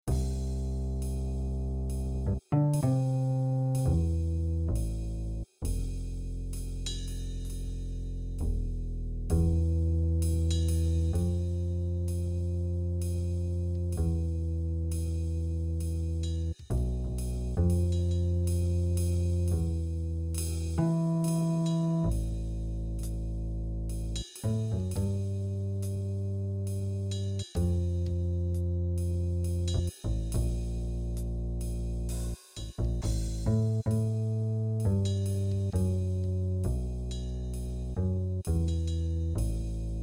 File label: Backing, jazz